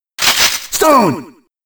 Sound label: sfx stone